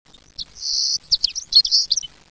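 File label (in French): venturon, sons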